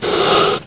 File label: fire hiss; Ferazel's Wand